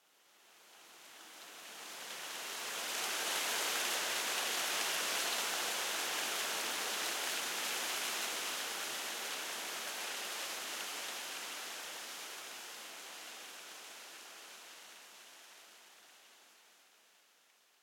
Wind Trees

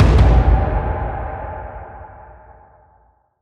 Stingers; The Dwarf Run; EV Sent Coffin

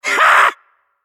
Wiz Surprised

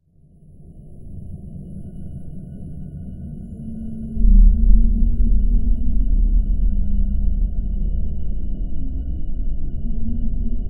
Dark Scifi Drone Mixed; Justin Danger

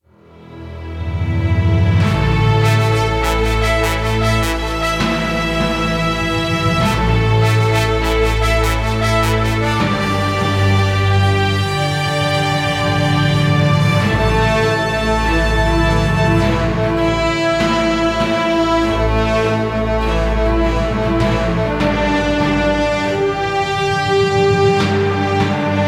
TENSION

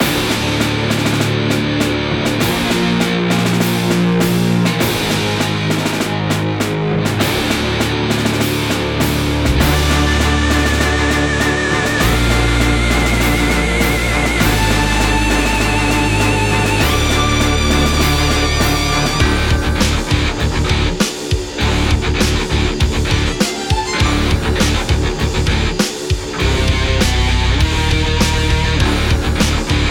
Metal Boss; Chilltheim

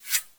bullet pass; teardown; ps5 windows xbox series xs

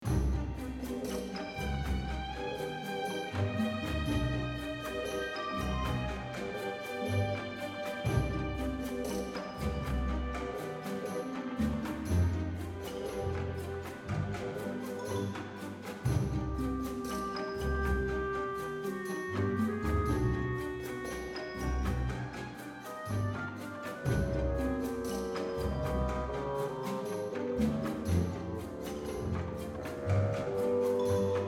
Puzzle Layer; From the Shadows

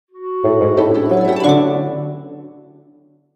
Jingle Surprise; mata hari windows gamerip 2008

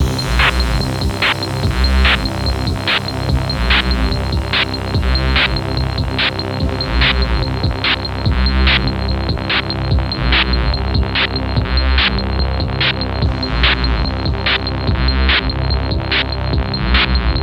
music simple loop; Equalizer

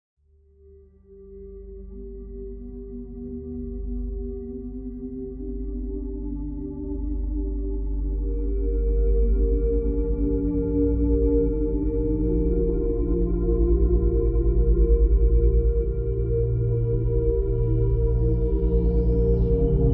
Magical Forest; demon strikes back

